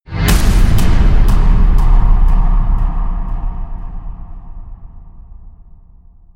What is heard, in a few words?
hit bolt incoming fire